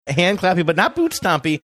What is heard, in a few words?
hand clappy but not boot stompy